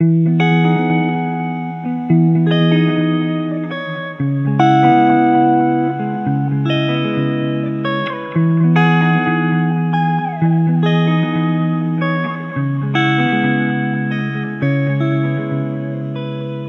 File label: songstarter 115 E, Pop; SD PASADENA Pop Sample Pack; Slate